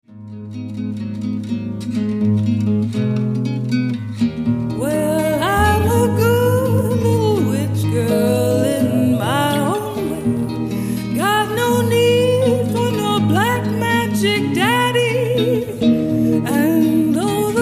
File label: Blues